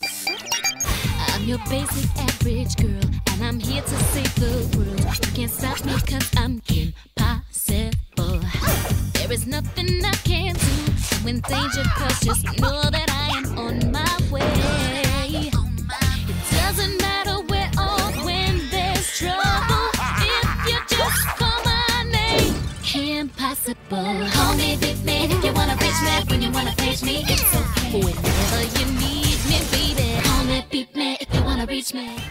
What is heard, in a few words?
Hollywood Song Ringtone